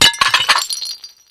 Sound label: Plate Underlay Death